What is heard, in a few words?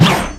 Murasama Big Swing